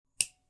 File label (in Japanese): ライターの着火音